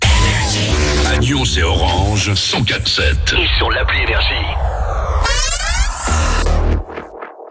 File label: JINGLE; NYONS ORANGE, 104.7 FM; NRJ